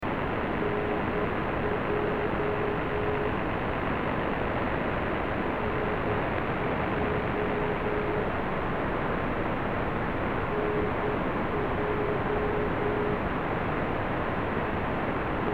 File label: Unid 384KHZ, NDB Beacons